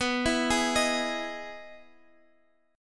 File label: E Major 7th B, chord